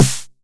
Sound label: 909 SD; Goldbaby Tape808 & Tape909; The Tape 909